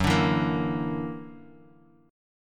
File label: F sharp Augmented 7th; strummed; 14,17,14,15; JGuitar